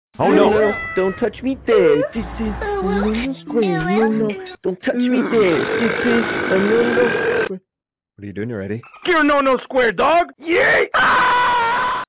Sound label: Hahahaha